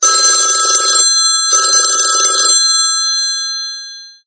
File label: Classic bell; Samsung Galaxy Europa GT-I5500